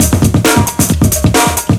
SPEED, Big Beat Drumloops; USB Soundscan vol.25; AKAI

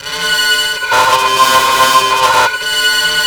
FUZZY LOVE, DISTORTORAMA; Spectrasonic Distorted Reality 1

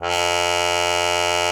cup mute F2; Xsample VOL-3

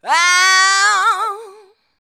"aauu", shouts; Best Service; Hyper Dance

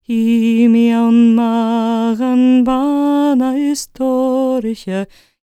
GAELIC, World Voices, GAELIC FEM; ILIO; Vocal Planet

